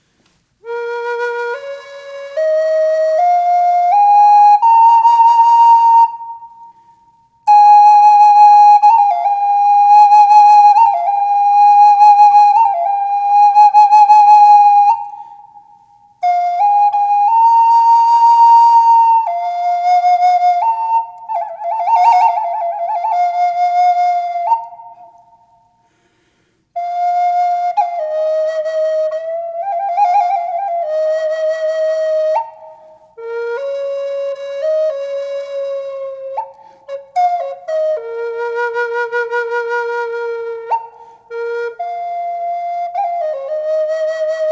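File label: reverb, flute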